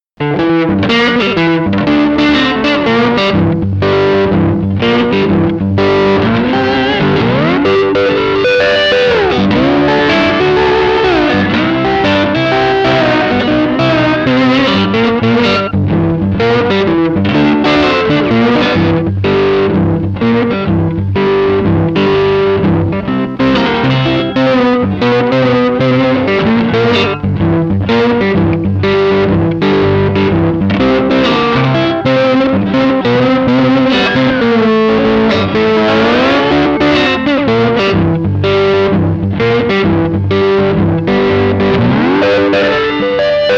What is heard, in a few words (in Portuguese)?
blues da familia instrumental; uplanes